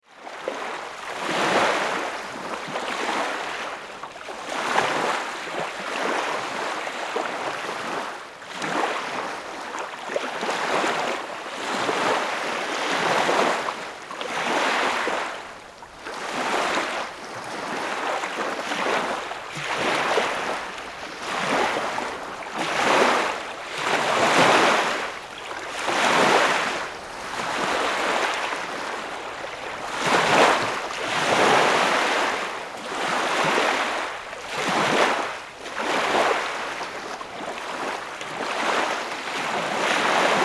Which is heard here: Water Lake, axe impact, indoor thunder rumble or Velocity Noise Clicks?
Water Lake